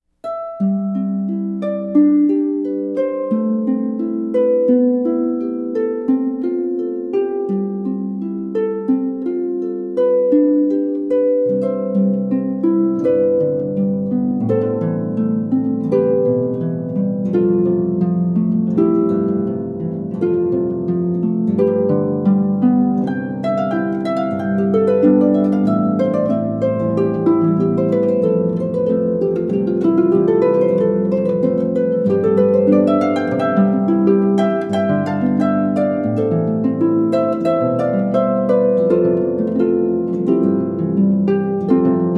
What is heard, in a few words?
solo harp; Canon